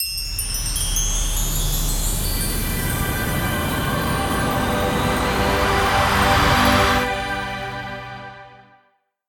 near Win Riser; netent; butterflystaxx

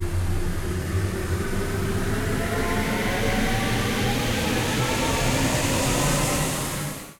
near Win; netent; neonstaxx

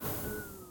near Win Flash; netent; neonstaxx

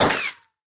drive By Impact Hole; netent; narcos

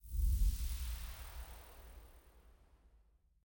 reel Spin; netent; jewelfruits

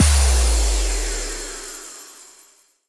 big win start; netent; victorious

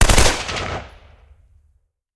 Police MG; netent; narcos

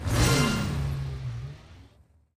medium Win; netent; multipliermayhem

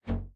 reel Bounce; piggyriches

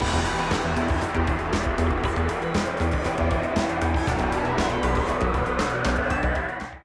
respin Start; netent; starburst

